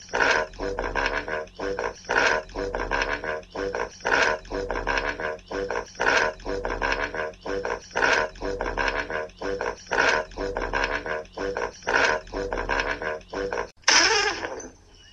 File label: tuba; stewie